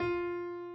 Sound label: key